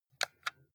console controller xbox xyab press soft

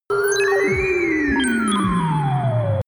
facility compute

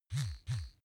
razor smooth double

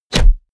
bow hit generic

